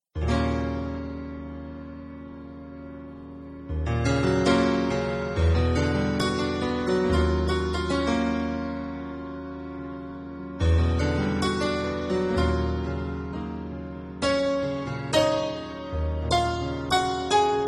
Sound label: scifi